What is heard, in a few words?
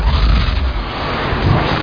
Wormhole close